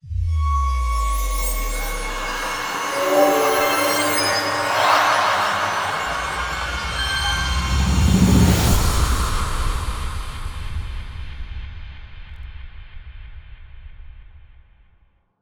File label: big Mirror Appearing; netent